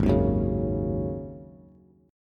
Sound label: Ab 7th Suspended 4th, Bass, Ab7sus4 chord; strummed